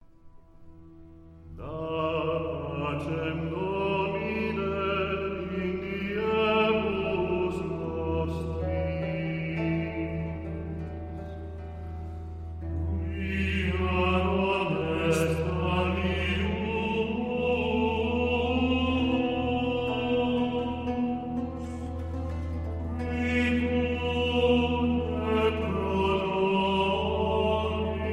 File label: Da Pacem (Gregorian)